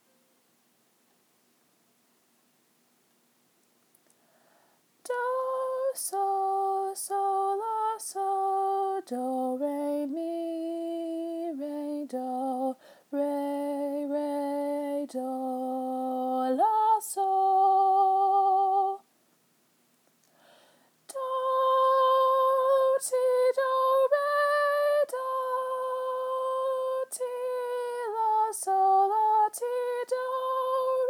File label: alto